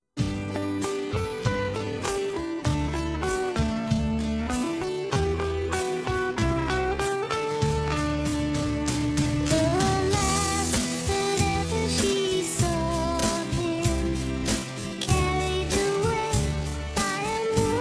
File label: flabiol lenta